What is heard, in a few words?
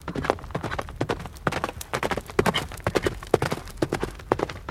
Horse Gallop By Horse